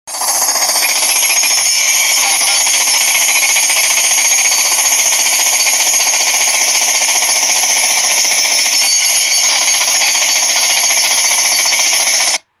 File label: construction